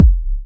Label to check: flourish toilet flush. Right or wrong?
Wrong